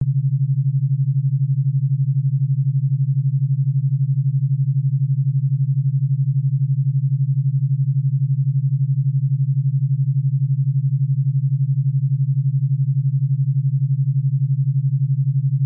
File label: high alpha, binaural beats